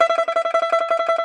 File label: Tenor Banjo, Plucked Strings